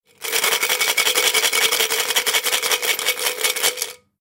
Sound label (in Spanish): hucha monedas